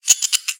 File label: Cymbals Monkey Laugh; Don't Be Afraid The First Toy